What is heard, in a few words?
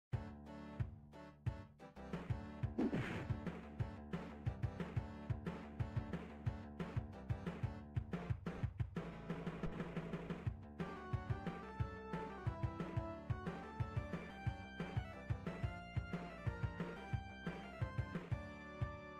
Street game Genesis; Zomboid; Video Game Consoles